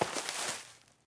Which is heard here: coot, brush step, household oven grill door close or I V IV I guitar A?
brush step